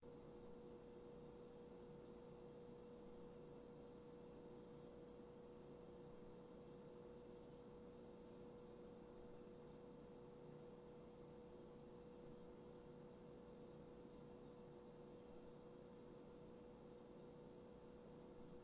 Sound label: Kitchen Roomtone; blindside